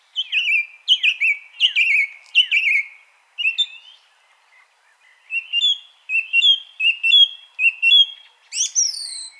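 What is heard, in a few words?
song thrush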